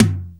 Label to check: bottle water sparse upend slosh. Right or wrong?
Wrong